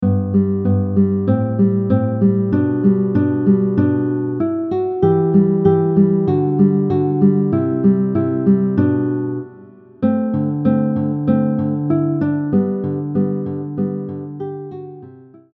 Andantino in G Major, Guitar Track; Carulli Opus 241 No. 5

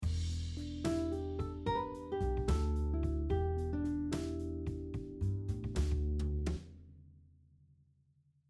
Rhythmic idea 2, 6/8 time, guitar; we start the phrase later in the 1st measure (beat 4 in 6/8 time); rhythmic idea example 2

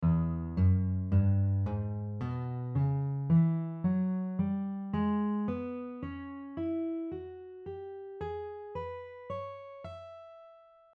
Ascending blues scale 3 octaves, guitar; The next two examples can apply to the key of E Major or C# minor; Ascending blues scale pattern - 3 octaves with guitar tabs

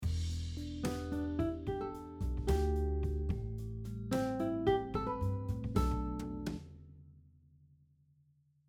phrase sequence example, guitar

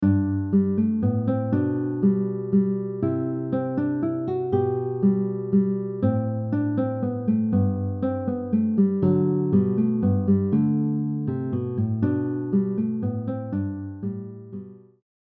guitar backing tracks; Minuet in G

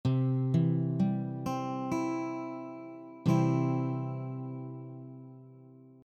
C Major, guitar chords; C Major chord basic; master the basic chords on guitar a beginners guide

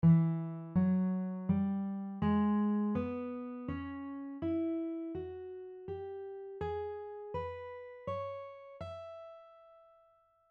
E Major blues scale, guitar; The E Major blues scale has the notes E, F#, G, G#, B, and C#

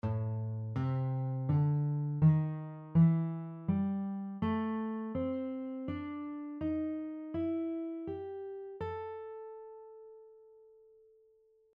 A minor blues scale, guitar; A minor blues scale which has the notes A, C, D, D#, E, and G

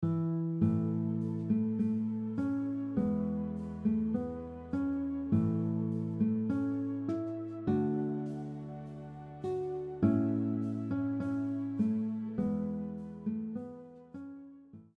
guitar; Auld Lang Syne; christmas songs

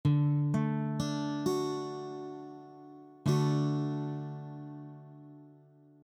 D Major, guitar chords; D Major chord basic; play from the 4th string down; being careful not to play the 5th and 6th string; master the basic chords on guitar a beginners guide

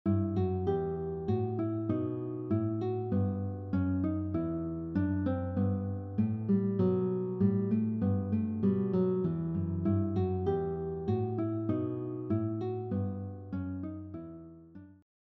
Bourree in E minor, classical guitar; Bach; classical guitar tabs

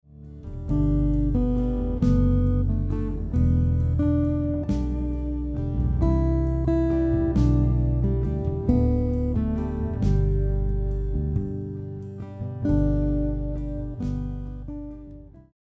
guitar; Jesus Loves Me; hymns and gospel songs on guitar

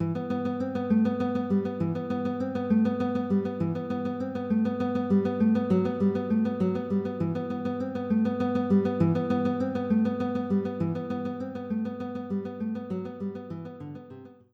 guitar track; Asturias Leyenda

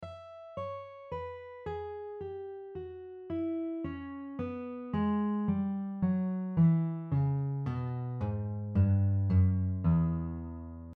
Descending blues scale 3 octaves, guitar; moving down the notes of the scale in 3 octaves; Descending blues scale pattern - 3 octaves with guitar tabs

